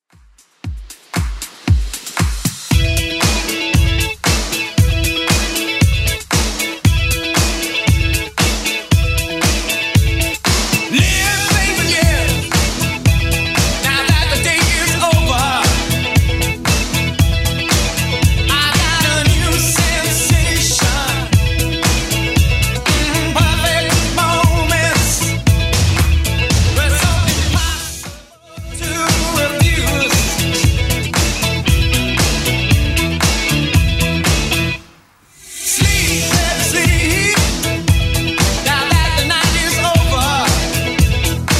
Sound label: 80s Club 116 bpm